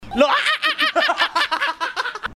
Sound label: laugh